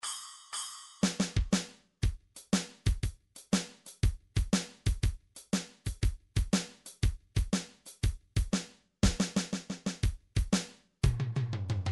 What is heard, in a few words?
midi drums, shuffle